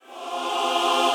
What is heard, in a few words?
choir